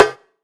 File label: Perc; Sample Banks; Percussion